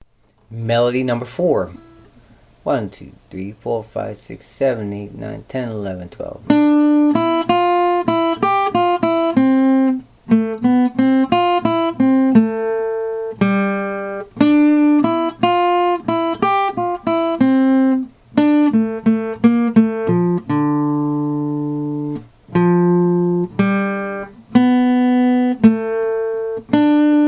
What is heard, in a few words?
melody